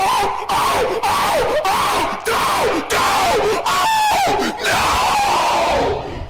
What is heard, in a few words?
windup; fatboy